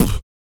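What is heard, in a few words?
vox percussion, Vocal Planet Jazz FX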